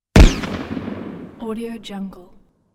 gunshot and echo long; chicasound

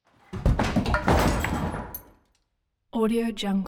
wood heavy debris fall

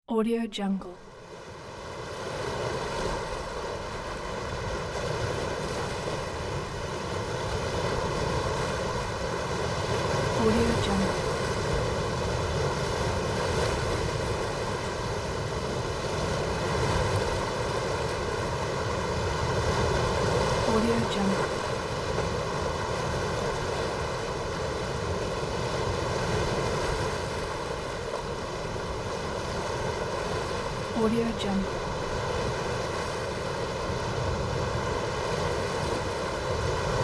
lake waves

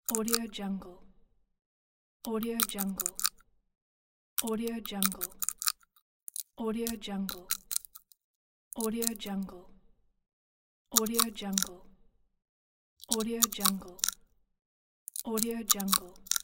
crack the core

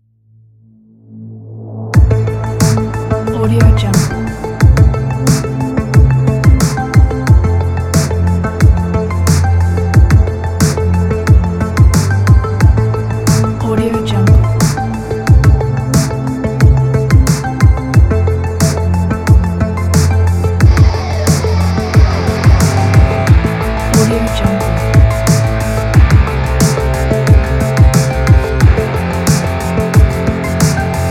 80s synth wave; musicocean

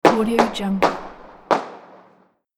firework display rockets

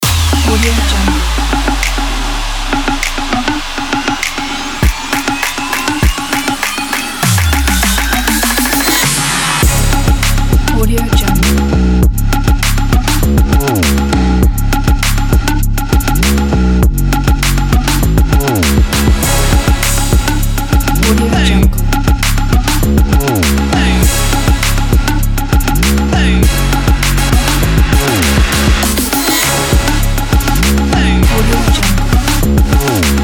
on a trap beat

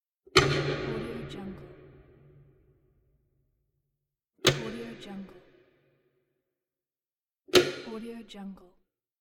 warehouse lights

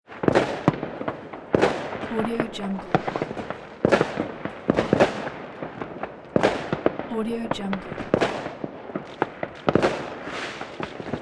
fireworks long new years eve

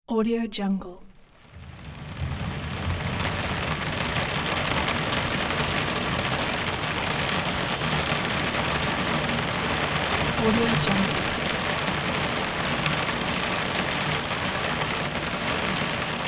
heavy rain on roof

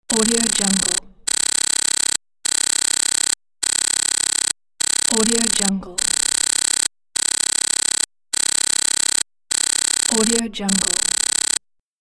scifi loading bar

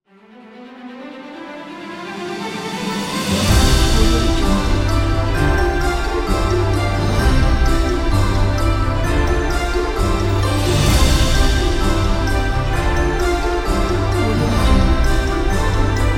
cinematic christmas intro

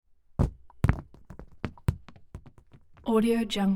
balls drop cascade onto mat or cushion surface; prosoundfx

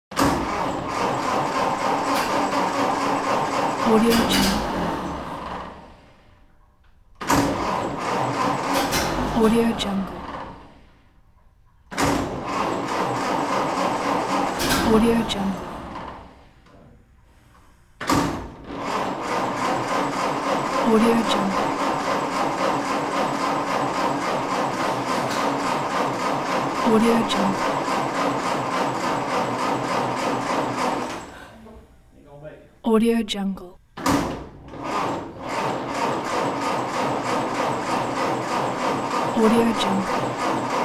old car trying to start

